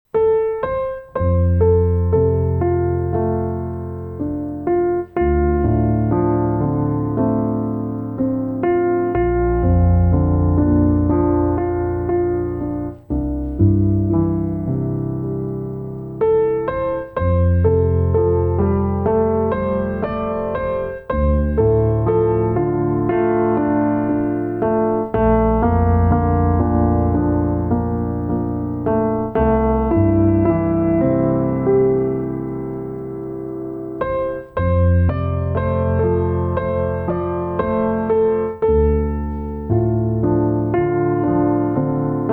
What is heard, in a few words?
piano